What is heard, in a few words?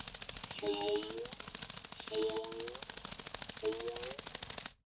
moth, monster